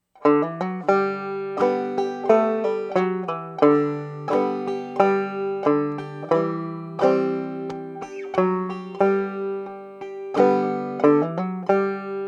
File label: ding low; Christmas